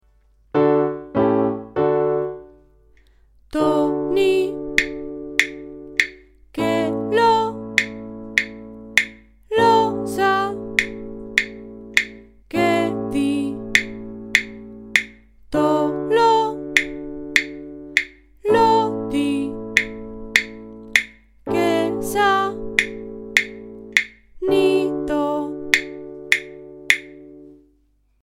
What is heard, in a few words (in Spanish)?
I V mayor silabas tonales con acordes Re mayor